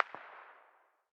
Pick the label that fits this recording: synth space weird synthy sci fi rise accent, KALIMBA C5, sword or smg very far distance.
smg very far distance